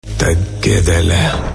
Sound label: Ringtone